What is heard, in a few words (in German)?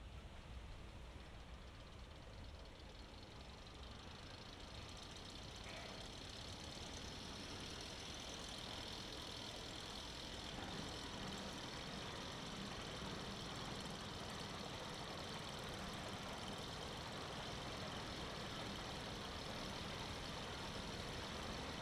Achterbahn; Lexikon-Roman